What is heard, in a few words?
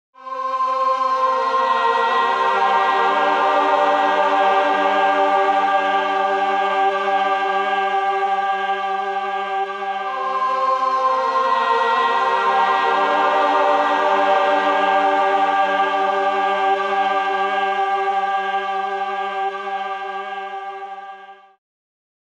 spaceship